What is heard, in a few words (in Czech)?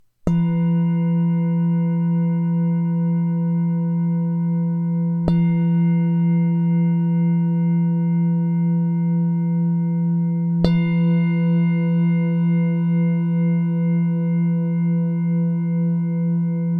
tibetska misa; zihana; e3; 21-5cm